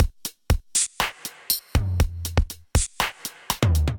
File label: DMX Kit, Drum Machines; DMX Tightdope Kit; Ableton; Factory Packs; Drum Essentials